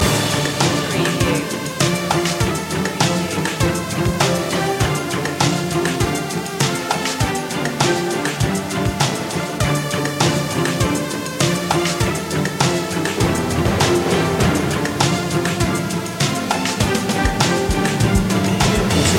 spooky loop; media music now

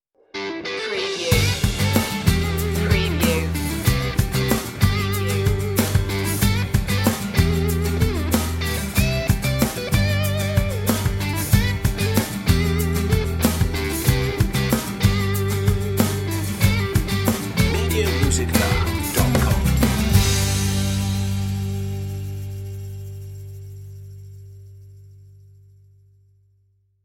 crash guitar

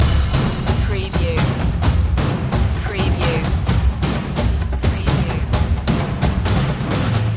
storming percussion loop

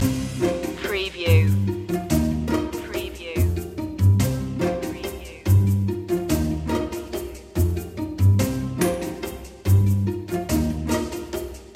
curious machine loop

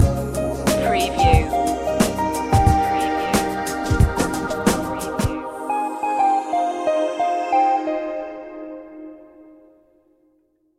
smooth waves stinger

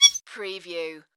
Tui Peep

